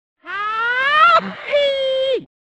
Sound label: yeehaw; reverse